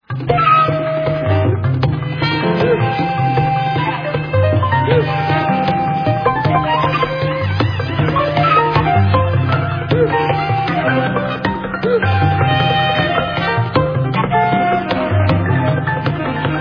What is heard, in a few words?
jungle jam loop